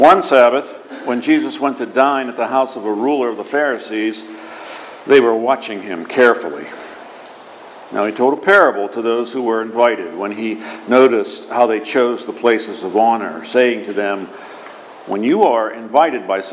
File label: Gospel